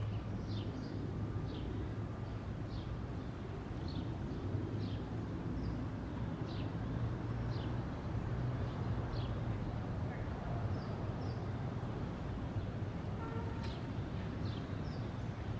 Atmosphere Em 123 BPM

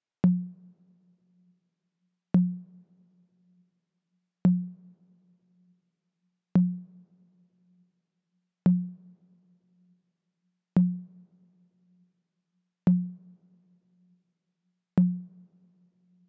114 bpm, Dm, Bongo

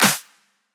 Slap Move Clap

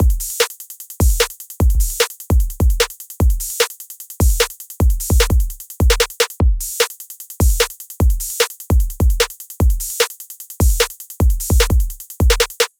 150 SingerDrums

